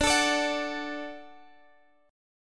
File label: D Major, D